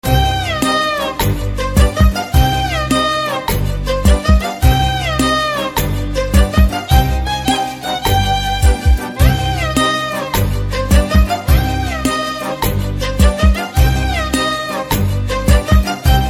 Guitar Ringtone

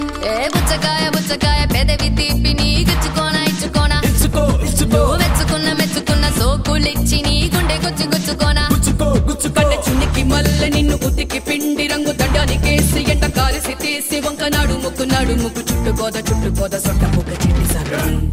Ringtone Female

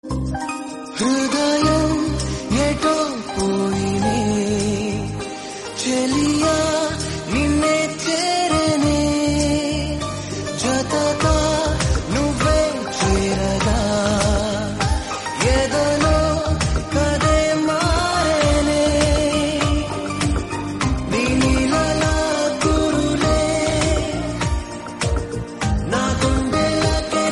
Happy Love BGM Humming Ringtone

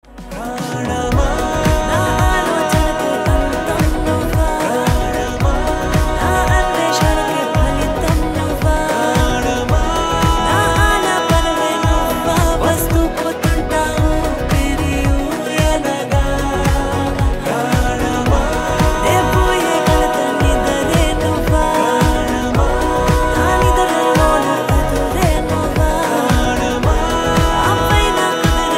BGM Humming Love Ringtone; WAR 2

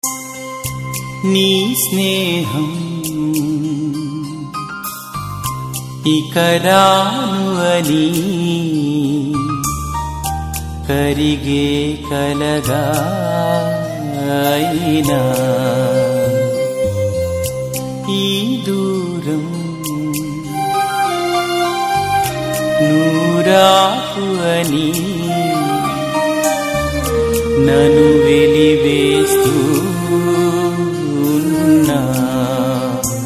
Ringtone

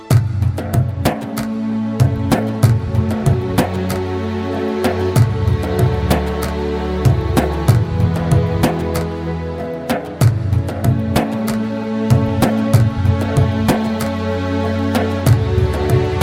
Karaoke Ringtone

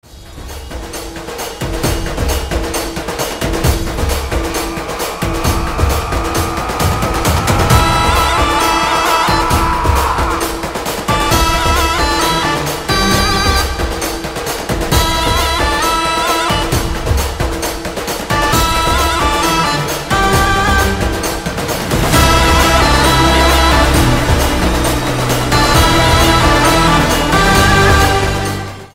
Trailer BGM Drum Beats Ringtone; VARANASI Glimpse

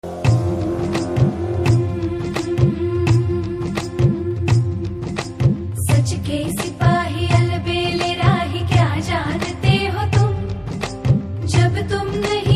Female BG Humming Ringtone

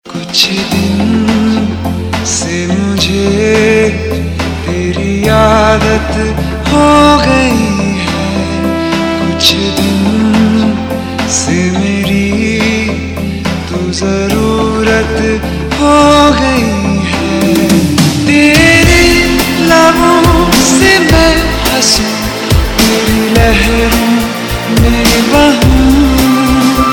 Ringtone

